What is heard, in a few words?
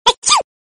message ringtone